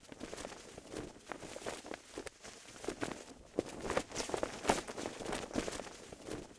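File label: tarp flapping loop; Star Wars Obi Wan; XBOX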